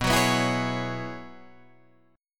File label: F sharp 7th Suspended 2nd Suspended 4th, B